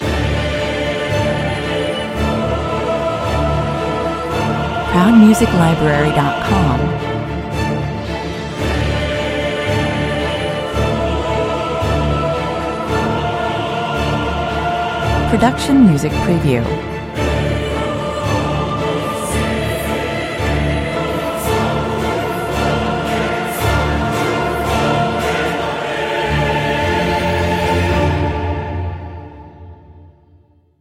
no percussion

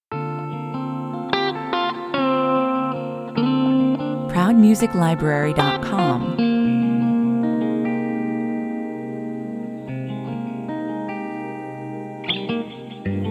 guitar stories 147 bpm

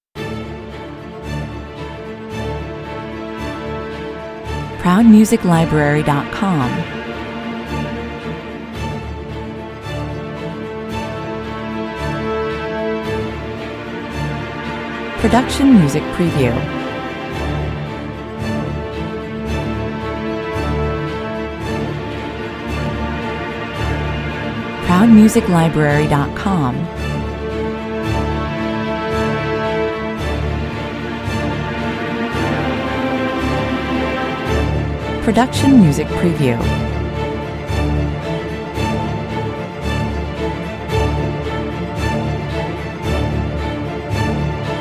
strings mix

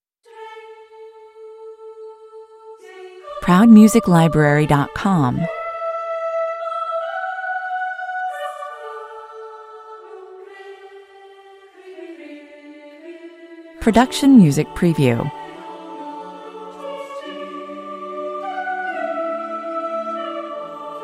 choral solo

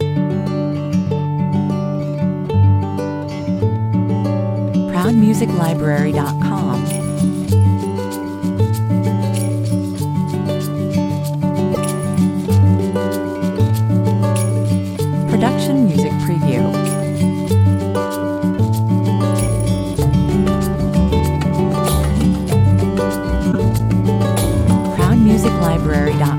think casual (no drums)

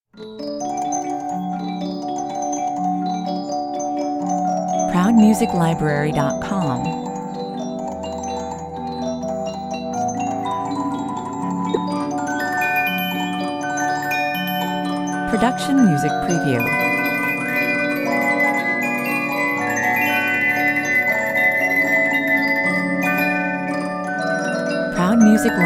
circus waltz music box

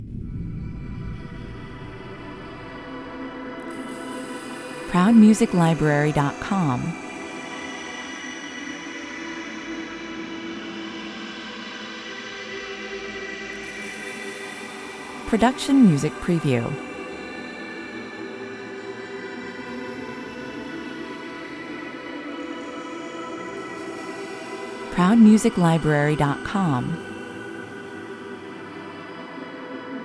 (ambience) 146 bpm

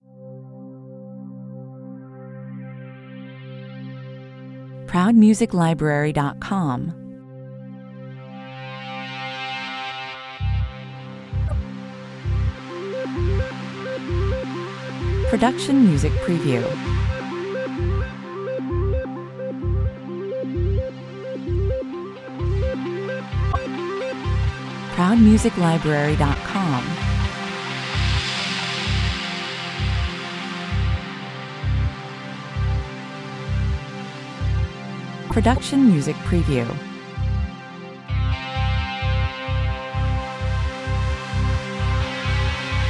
electro minimal ambient mix

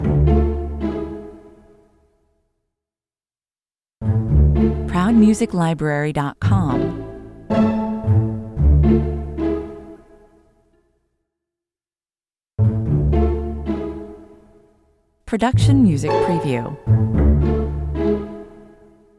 strings only

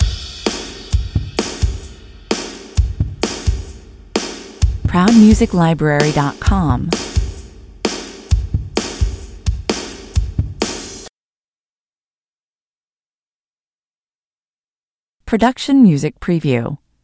loop drums

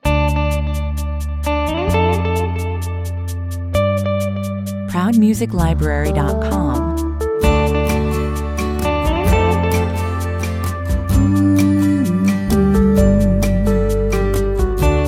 jeux 130 bpm

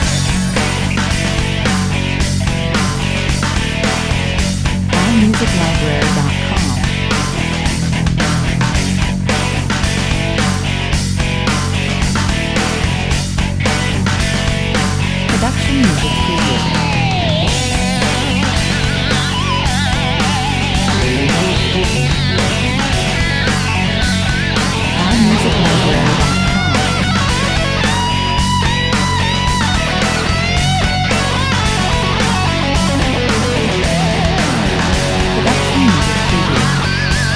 rock loop 110 bpm

